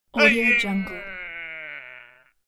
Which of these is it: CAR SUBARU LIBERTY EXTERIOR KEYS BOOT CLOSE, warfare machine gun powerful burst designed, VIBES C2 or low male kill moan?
low male kill moan